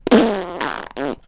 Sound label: Pig Tongue; Angry Birds Space